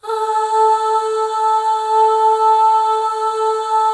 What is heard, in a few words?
Voices, FEM AHS; Spectrasonics; FEM.2 AHS